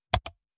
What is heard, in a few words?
Computer mouse single click